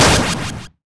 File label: shot sg; svencontra2